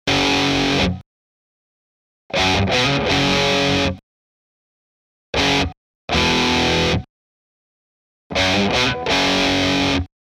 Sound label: Amp Guitar Denoised By Phase Inversion